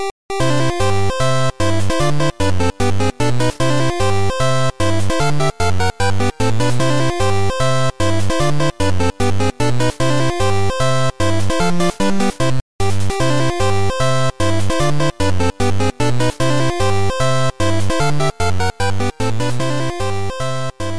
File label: vs win